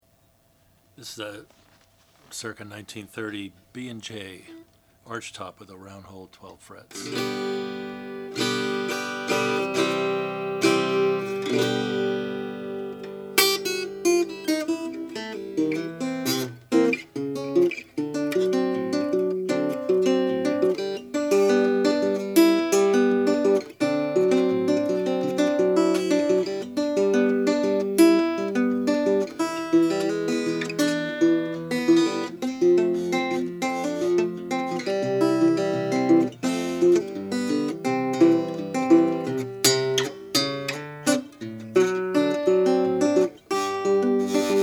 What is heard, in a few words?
regal serenader archtop natural